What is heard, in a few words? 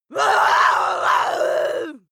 witch yell